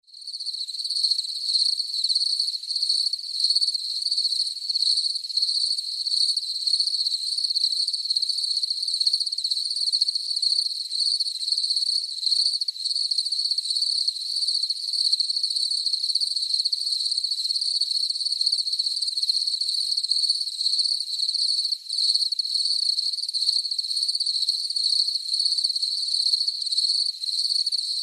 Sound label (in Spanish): grillos agudo Paisaje sonoro NATURA